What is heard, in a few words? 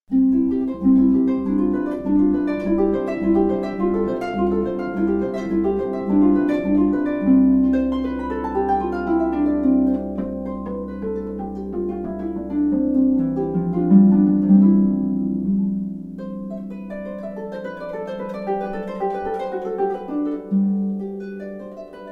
carillon Handel